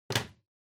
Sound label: Power Strip Put